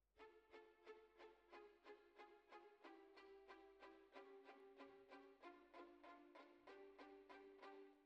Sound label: Flute